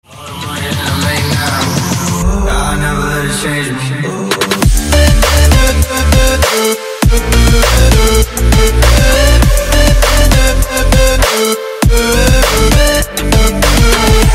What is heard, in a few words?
dark heart trap remix